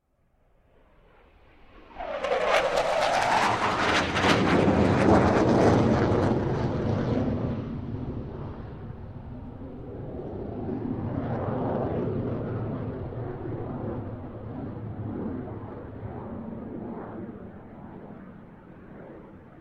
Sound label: F-16 Pass Overhead; Sound Ideas; Series 2000